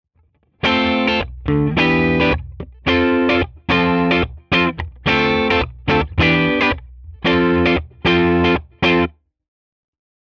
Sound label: Schecter BANSHEE MACH 7 clean Groove all positions